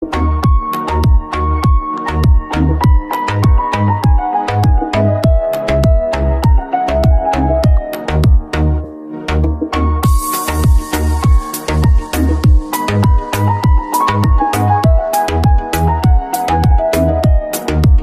Piano Remix

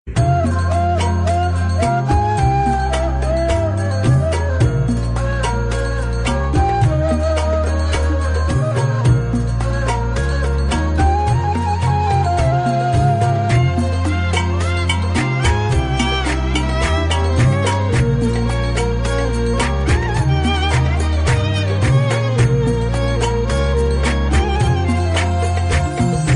Sad Tune

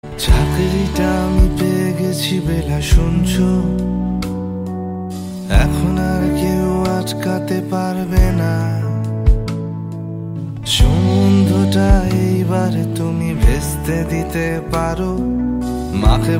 unplugged

